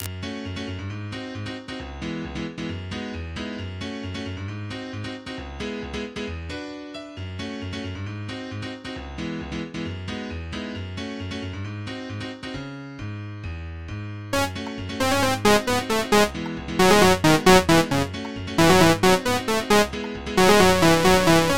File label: Chess Type Beat